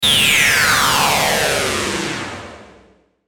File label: LASER DESCEND, Laser Descend Chorus Delay; Production Element Imaging Element Accent Transition; Laser Sound